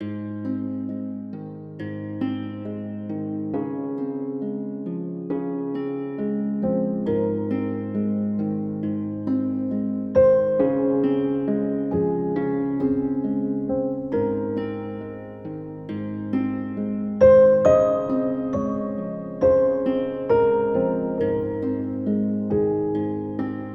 Falling leaves, Fantasy Music; Free Fantasy Music Pack

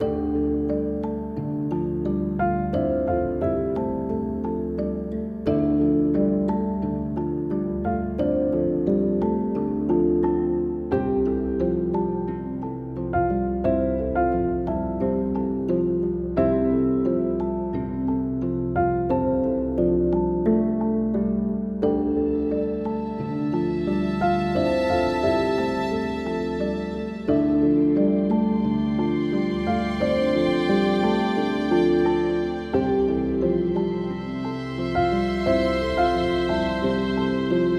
Living forest, Fantasy Music; Free Fantasy Music Pack

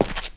Concrete Footsteps; StarterAssets; ThirdPersonController

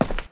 Concrete Footsteps; StarterAssets; ThirdPersonController

